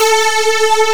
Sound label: SYN, SYNTHESIZER; DIGI WAVE #1